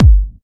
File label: BD Trancy; Club; VEC1